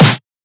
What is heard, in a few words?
snare oneshot; GhostHack